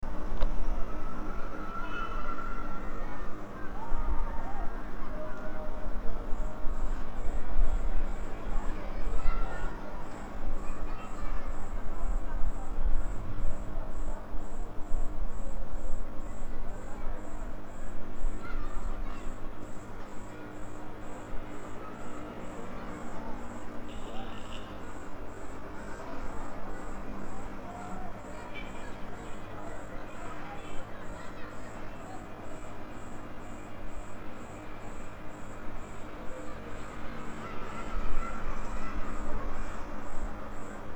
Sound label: Children playing, Africa